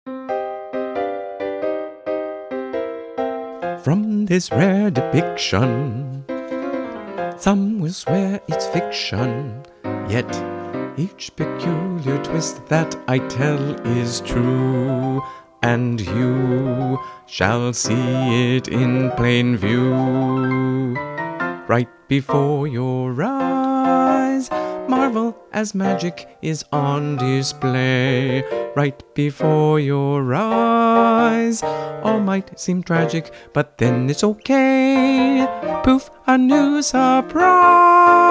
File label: audition vocal guide